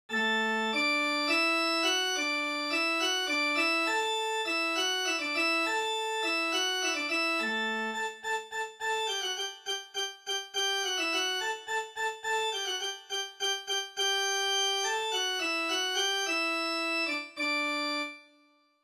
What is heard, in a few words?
hornpipe, midi; handel